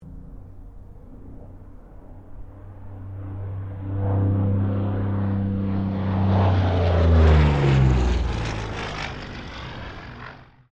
AIRCRAFT P-40 KITTYHAWK PASS STEREO; P-40 Kittyhawk / Warhawk / Tomahawk; Airplanes

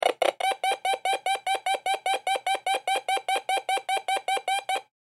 Metal Detector Beep Multiple Long; Miscellaneous Electronic; Foley; Blastwave

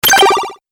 Arcade 8Bit Robot Fx, video game

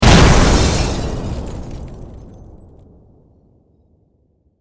Explosion Debris Glass Wood

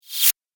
Chirpy Whoosh; sound design

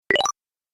Pickup Points, video game sound effects

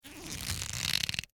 Rope Twist Heavy, Cable; Hand Tools; BlastWave

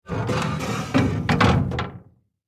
Household Fireplace Doors Close Glass; Fireplaces

